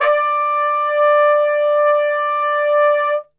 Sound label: D 3, Brass; Best Service; Brass Super Section